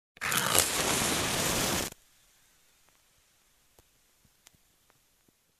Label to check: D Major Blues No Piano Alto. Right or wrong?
Wrong